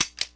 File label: no ammo, weapons